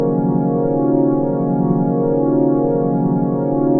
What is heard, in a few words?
mks pad 7; Pads